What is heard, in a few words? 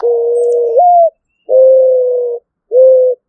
mourning dove call